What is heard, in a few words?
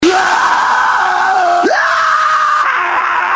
scream